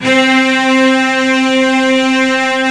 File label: C 3 CELLO; CELLO 1